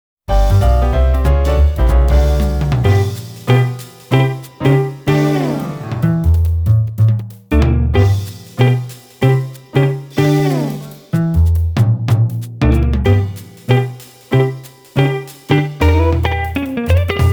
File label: JAZZY CAT; Guitar Freaks 2nd Mix